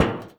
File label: impact, metal barrel